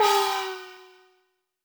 Shock Chord B (High); the legend of zelda spirit tracks

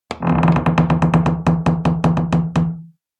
Door squeak creak; Devour

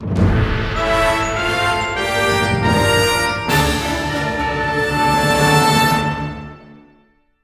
Boss Clear Fanfare; The Lady

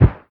Carpet

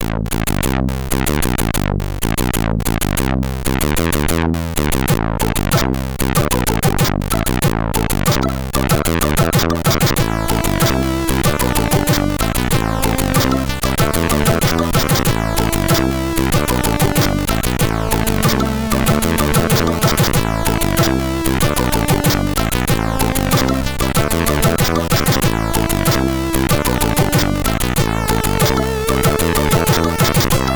Screen 4 (SID Stereo); Hollywood Poker Pro; Commodore 64

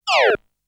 shooting sound

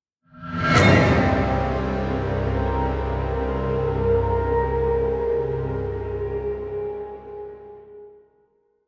Stinger Drama; Dishonored

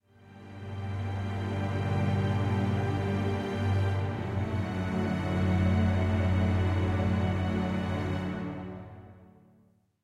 Stinger; Caves Exit Caves

